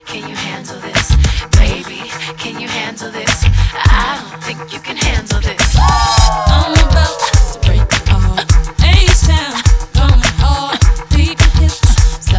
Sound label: Pop, in stereo